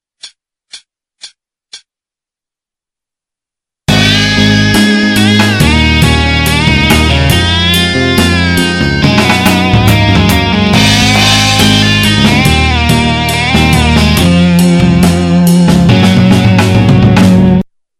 solo all 70

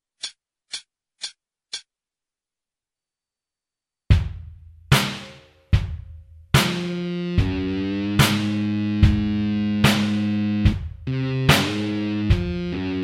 solo backing 73

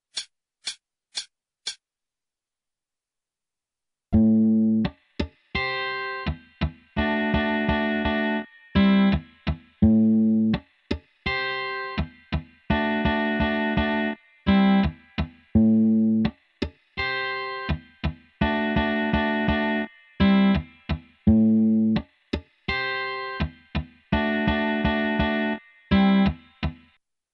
triad groove a minor dorian 87

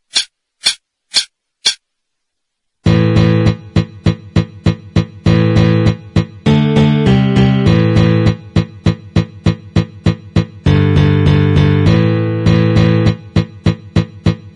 Acoustic Beginner 100, guitar

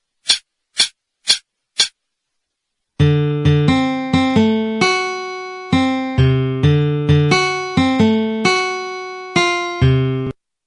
verse 66

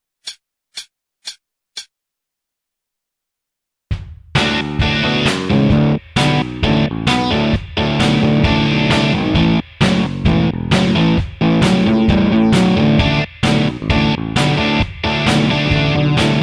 solo backing 132

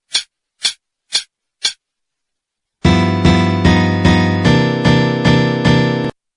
Chromatic Blues, 150, guitar

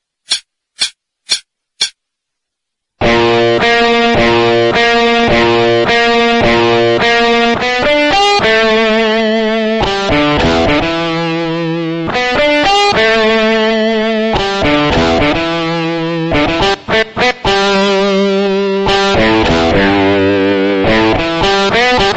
licks 106

